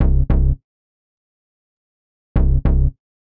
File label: Bass, 102 Cmin; Hip Hop